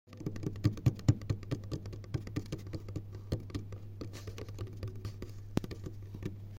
noucnouc

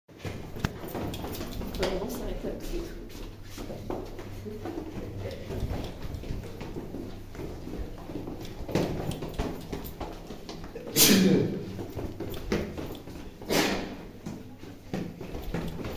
pas

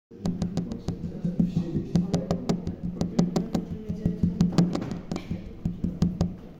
touctouc

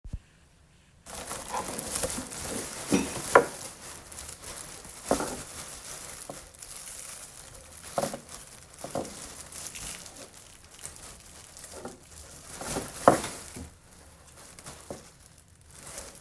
Poubelle; deambuler

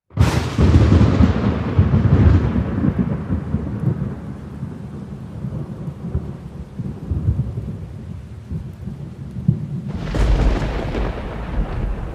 orage; exterieur; maison

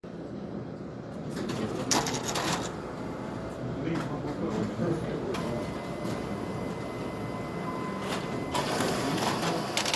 imprimente

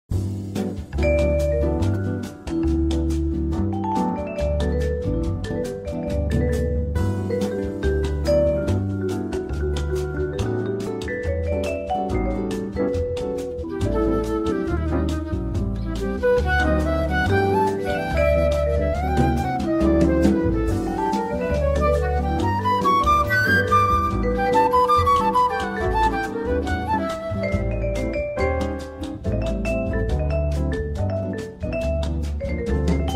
musique

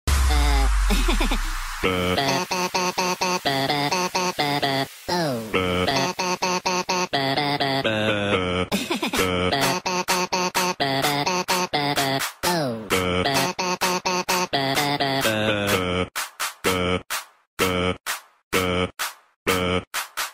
Musique qui bouge; minions

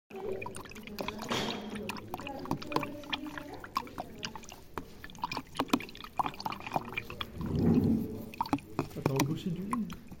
Pinceaux

